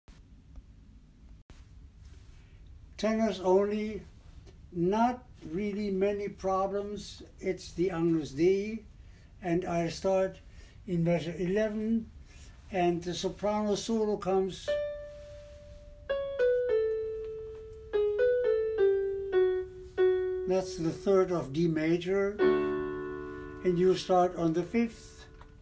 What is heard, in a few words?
Schubert, Agnus, Tenor; rehearsal